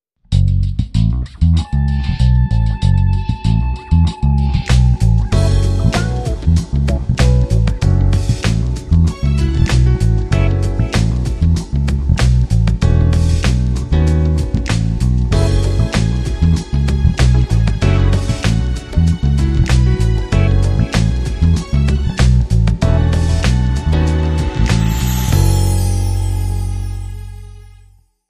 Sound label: Satin Bass